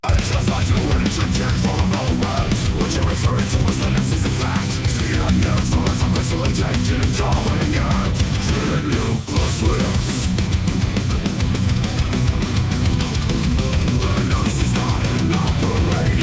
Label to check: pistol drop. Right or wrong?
Wrong